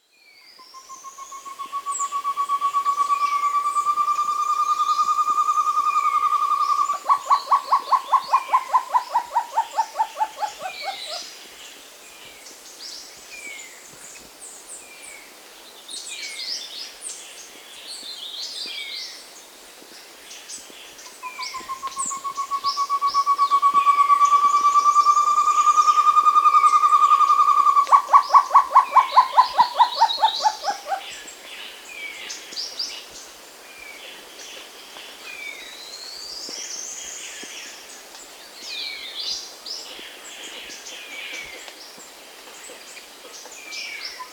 Short-Tailed Antthrush (Chamaeza Campanisona), Andean Birds; S. America